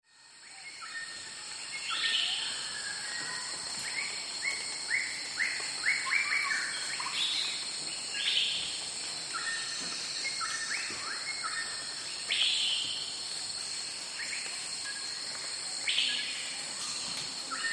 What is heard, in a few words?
Jungle the morning insects birds monkeys and gibbons wide